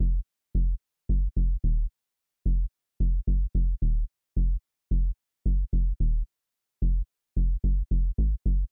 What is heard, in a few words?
Sample Bass, pop rap